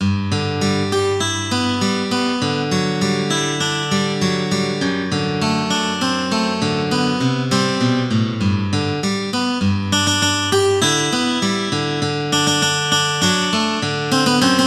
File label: synth